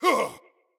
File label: attack grunt; smite; odin